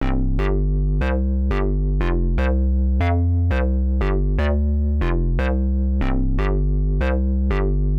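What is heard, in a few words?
square bass B C, Nu Jazz House, Bass Loops, 120 bpm; PowerFX - Nu Jazz House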